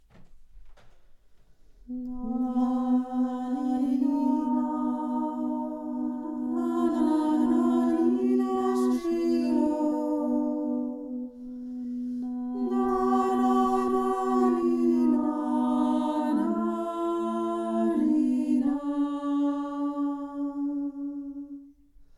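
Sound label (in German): nanina shwilo georgisches heilungslied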